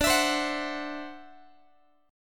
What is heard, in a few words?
D 9th Guitar, chord; strummed; JGuitar